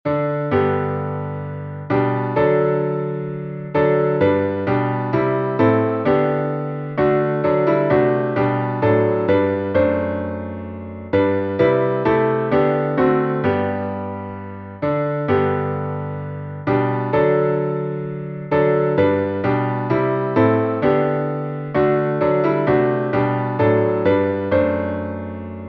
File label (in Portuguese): salmo instrumental